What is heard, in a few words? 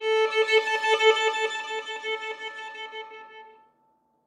Violin Octave Flutters